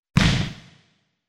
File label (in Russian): volejbol zvuk podachi